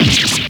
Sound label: stab